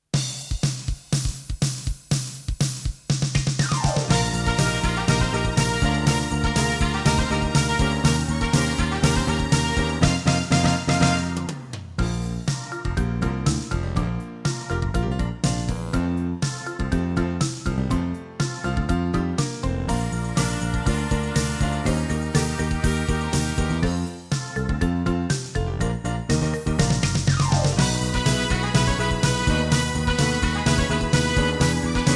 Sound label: karaoke only